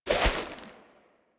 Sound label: Fishing Spear Charge; Warframe; Weapons; Eidolon Fishing Spears